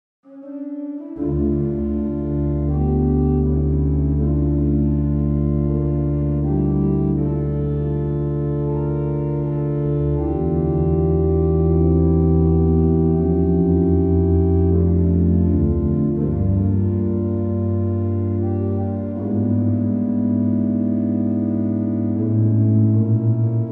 Fond, Organ Music, Souvigny; Marchand L Livre 1

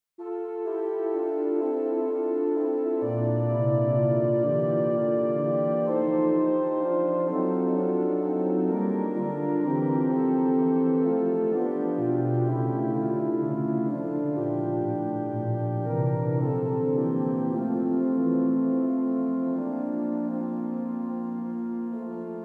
Choral; Brahms Jo

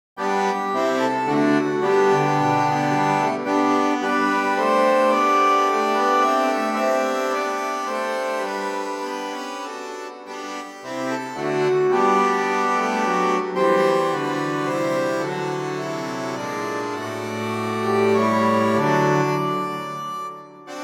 Noël angevin G, harmonium; L Organiste; Franck

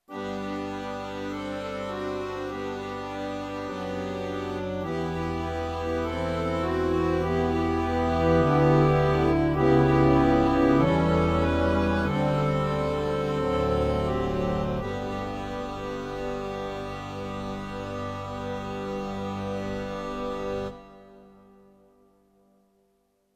Amen G, harmoniums; L Organiste; Franck

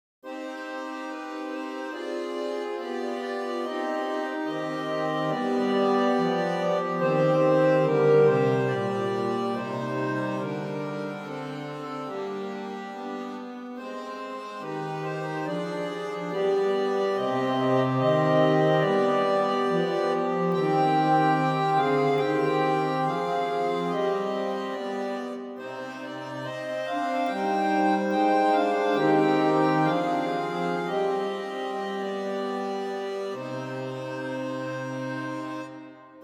Kyrie Moderato Noel, harmonium; P Posthumes; Franck

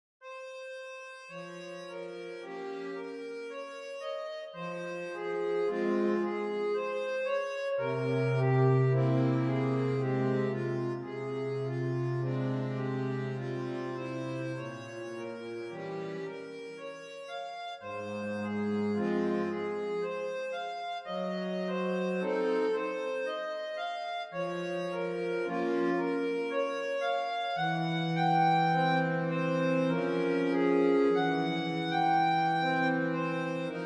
harmonium, verset Fm; Franck

